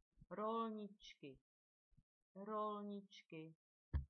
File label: jingle bells; rolničky